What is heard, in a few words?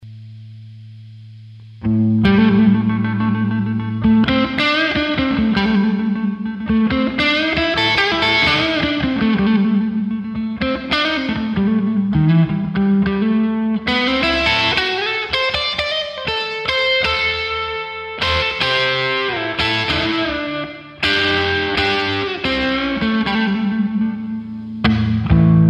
Neck Fender OD3 Blues, humbucker